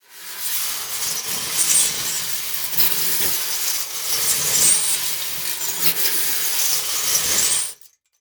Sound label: Industry Tool Wire Brush scrape Metal Sheet